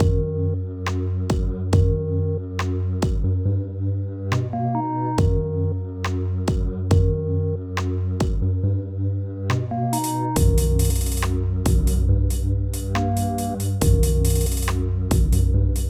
dungeon deep

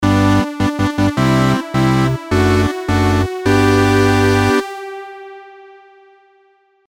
8Bit Action- Victory; Bogart VGM

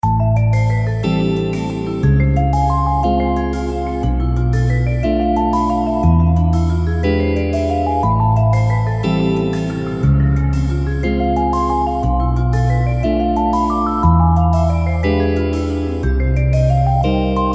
jazz

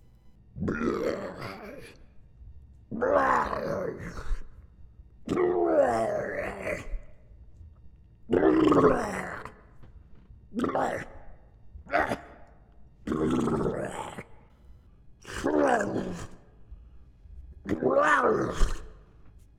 ghoul sounds large

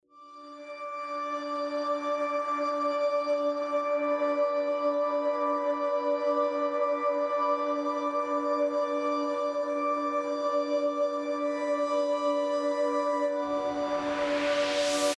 Lifeforce House Intro

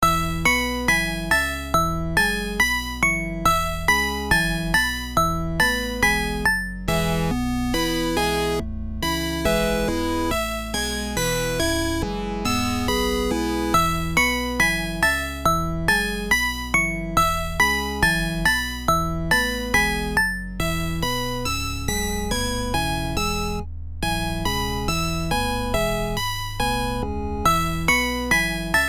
youthful elf seeking adventure chiptune

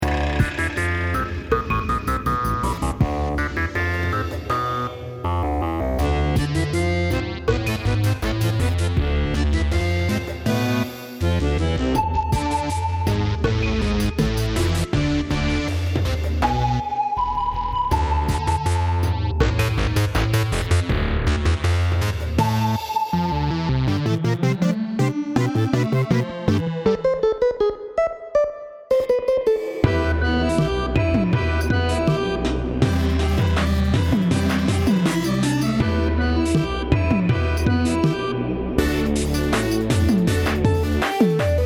loop; baby black magi

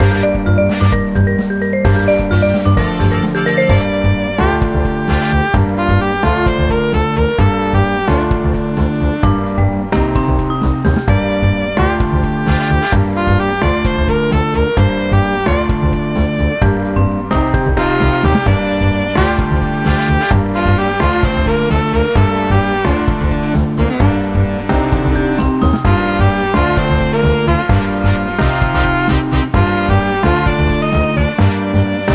jazzy battle theme bpm130

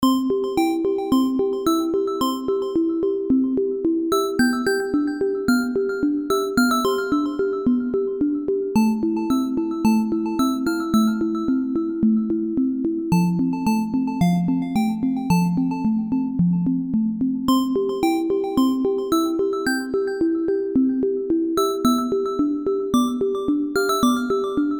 Town Theme Synth

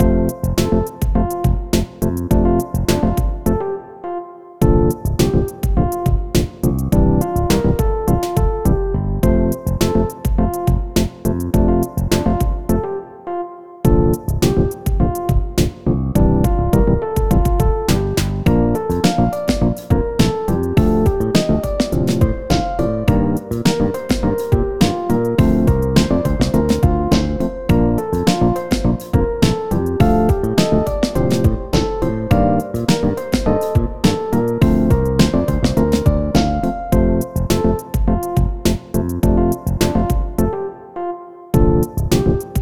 furniture shop loop

